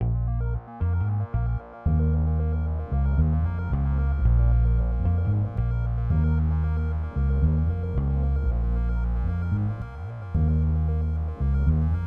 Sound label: Ghetto Bass 113